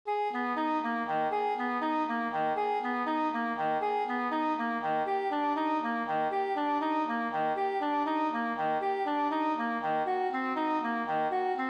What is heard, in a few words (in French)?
ARPEGES ENIVRANTS; Piano; Mélodies